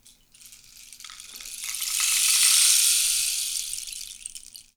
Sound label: RAINSTIK; PlatinumPhattCD2; E-MU Producer Series Vol. 8 – Platinum Phatt; Flexis Etc